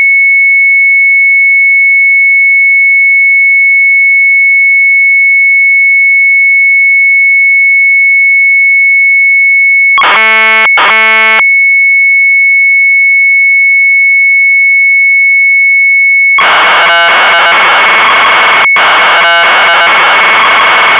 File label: MZ800 Tape, sharp; Veelh Ellips; retromelsarchive